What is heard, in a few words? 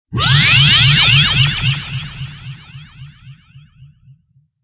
disruptor